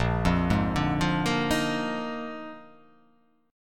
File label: A sharp 11th